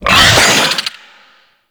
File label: Infested Ancient Pain, Enemies; Warframe